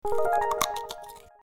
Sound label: collect carrot; Dora and Friends - Legend of the Lost Horses; collectible